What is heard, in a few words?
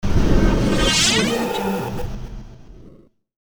transitioning digital scan; hollywoodedge